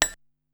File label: Noise Vibe, Korg Wavestation-SR Drum Kit